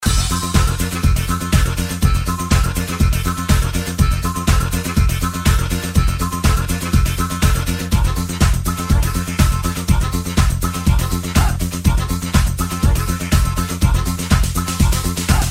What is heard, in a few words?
harmonica